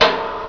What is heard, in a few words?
MENU CLOSE